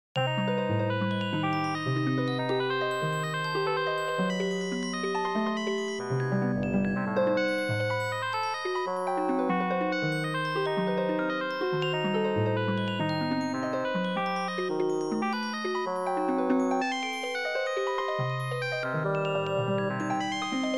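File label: Bassoon solo with harp celesta and glockenspiel; Basson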